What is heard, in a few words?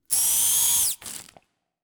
Spider Attack